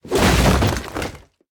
destroy; hellbound

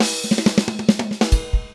Drums Loop; Feel; NiceVibrations; AHAPPresetsDemo

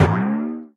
reflect, casual taps; SamsonGame

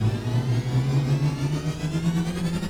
Speed up; Shuttle

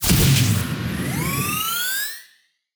Speed up

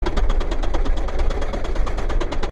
medium, RollerCoaster; Dreamteck; Splines

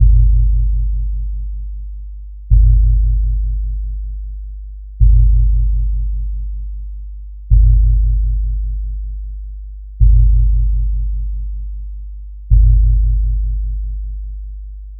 ambient fx, SciFi Ship; SciFiShipController

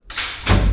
metallic engage; SciFiShipController

